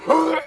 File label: evil cloud hermit damage